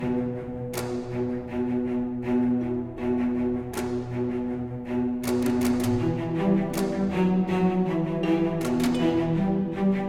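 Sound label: Exploration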